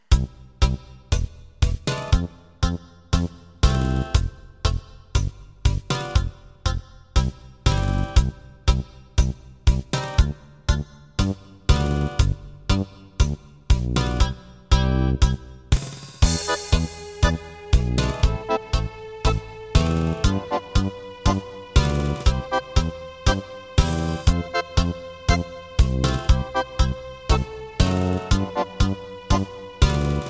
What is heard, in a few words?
Tango